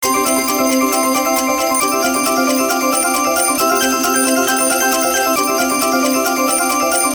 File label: count up, slot; Hot 40